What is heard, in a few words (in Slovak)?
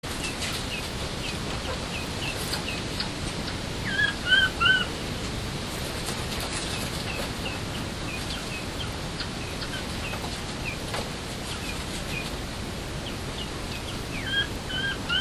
prepelica cinska